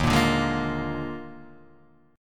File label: Eb Major 7th Suspended 2nd Suspended 4th Eb Guitar Half Step Down